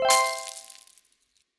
bar filled; Skillzgaming; gems odyssey

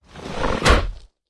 bonus allirog melee; Recode; rampage riches

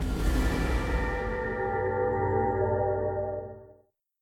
Reel Spin; Mirror Magic

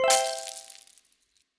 bar filled; Skillzgaming; gems odyssey 92 desktop

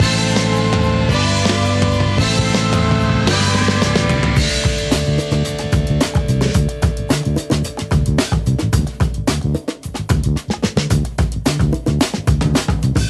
freespin intro transition; riviera Star; Fantasma

